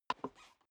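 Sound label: step dress boots wood creak single stair down, footsteps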